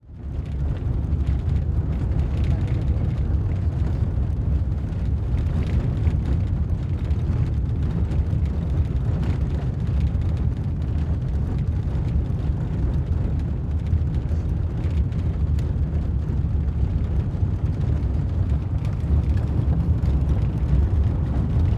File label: From Inside Suv Driving Through Dirt Road Truck Passes By